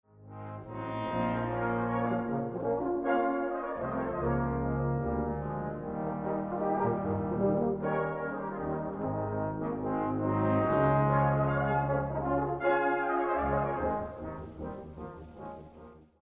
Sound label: Sound out the Proclamation